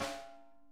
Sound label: snare tap; Sonic Reality